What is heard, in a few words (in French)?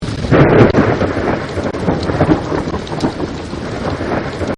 fond orage; Collection Synesthésie; Isabelle Grosse